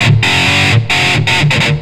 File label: Riff, Industrial; Nasty